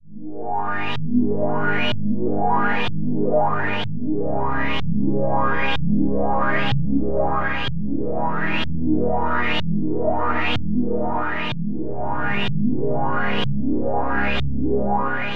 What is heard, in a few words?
Disco House Wet; filters bundle